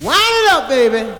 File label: VOX GENERAL; Megabass Remix! 2